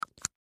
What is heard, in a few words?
MedicalScientific; Soundfx III